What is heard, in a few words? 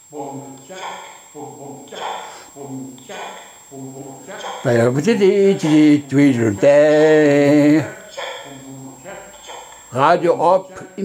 Jingle